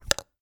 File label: household torch flashlight maglite battery x1 insert; zapsplat